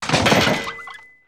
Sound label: unicycle dismount; weapons; dota; ringmaster